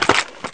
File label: generic, items; kingpin